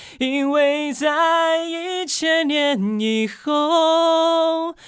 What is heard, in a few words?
Tenor